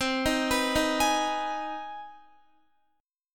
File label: C Minor Major 7th Sharp 5th C Guitar 4F,4A,5C,5G,5C,6E; CmM7#5 arpeggiated; JGuitar